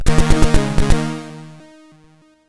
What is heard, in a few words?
Outrun sid MOS6581R3